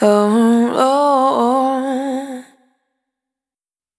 Anna Banana, Vocal Samples, Single Voice Melodic Loops; Singles